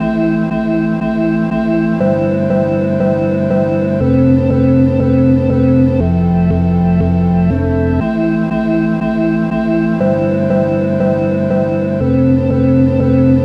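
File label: synth loop stuck 120 A minor; Virtual Riot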